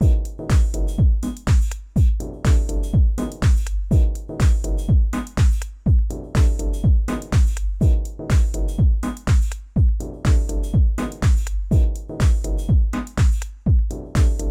UH-7000 X18 loop, digital analog converters in elektron gear overbridge